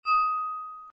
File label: glass ring; FlashChat v3.931